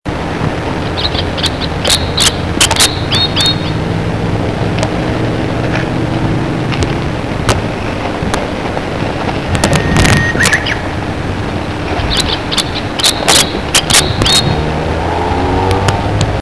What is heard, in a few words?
bird